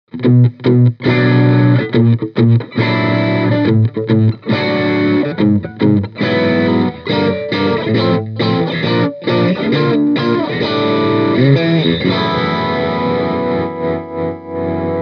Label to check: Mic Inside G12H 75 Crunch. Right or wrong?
Right